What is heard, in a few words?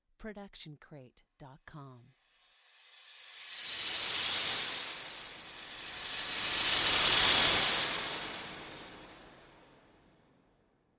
studdered reverse cymbal answering echo; soundscrate